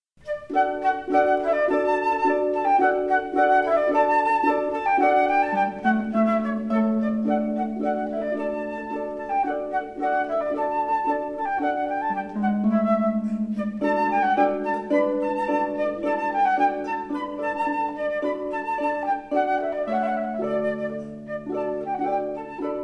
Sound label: with harp, Flute; Spring